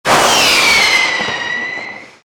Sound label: rocket sweep add